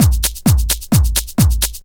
drum 130 straight; Techno Trance Essentials

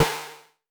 Tempest Analog Snare, Drum Machines; 300 Drum Machines; Dave Smith Instruments

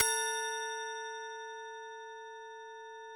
oriental A4, Yamaha VP-1; Music Store

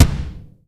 POWR BD, POWER DRUM; AKAI S6000 CD-ROM - Volume 1; DRUM RYTHM

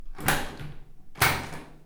lock that door

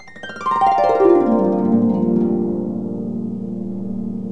HARP GLISS

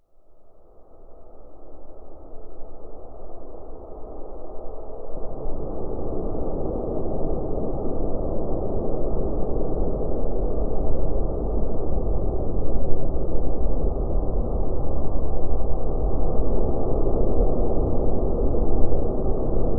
DARK HOLE, DEEP ATMOSPHERICS; Sonic Foundry (Sony Creative Software); Vir Unis Infusion

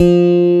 F2 STRAT, Bass Guitar; AKAI S6000 CD-ROM - Volume 1

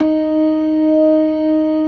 LOW MAN, LOWER MANUAL, Organ; InVision Interactive; Keith Emerson; The Most Dangerous Synth and Organ

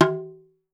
big funky, cowbells; Voice Crystal - Steve Reid Definitive Percussion

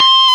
GTR FUZZ; Club-50 - Foundations Roland; Dirty; Stack

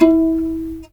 JAKAY E5; Spectrasonics; Heart of Asia

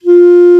Clar p F3; Digital Sound Factory Virtuoso

